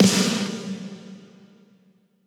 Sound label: Impacts, Effects